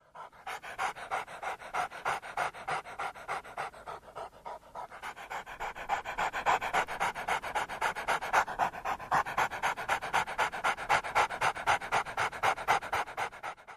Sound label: Dog Panting Hvy